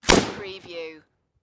Machine Gun Bren

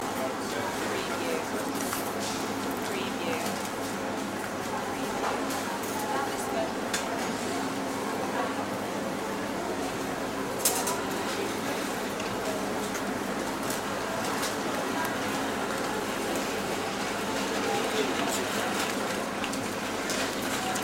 supermarket very light

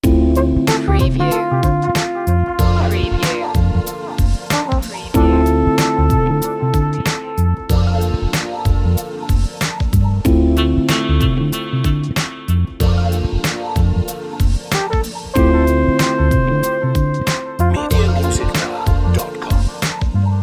night tales loop

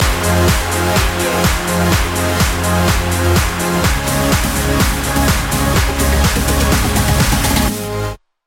heartburst stinger

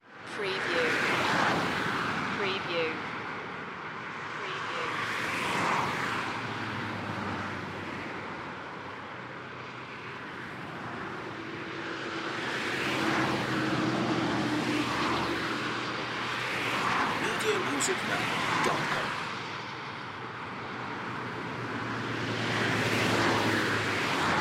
Freeway Traffic

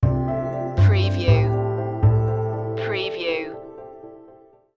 eastern vibes sting

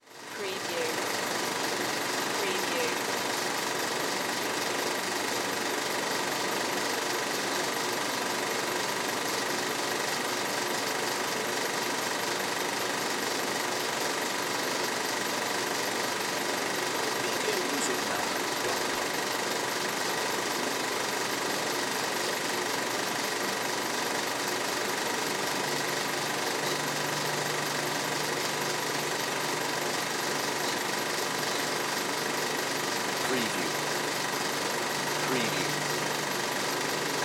Movie Theatre

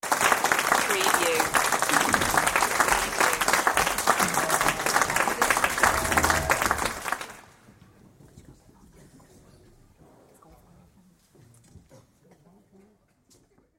Audience Applause; beanstalk audio

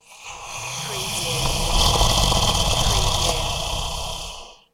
Alien Monster Breath

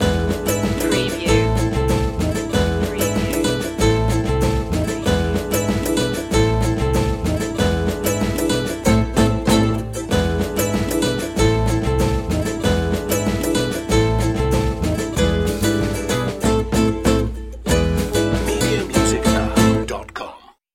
latino carnavalito loop

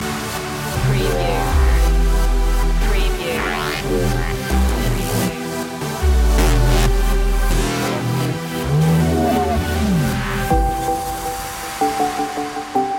moving up

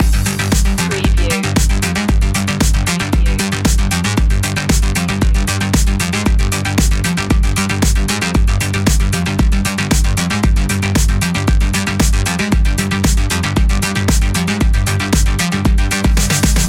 electro circuit loop

